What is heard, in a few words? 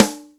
Dry sd, Roland MC-303 Snaredrums; Drum Samples